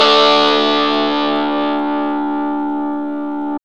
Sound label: GTR; AMG - Now CD-ROM (Roland); Art of Samplng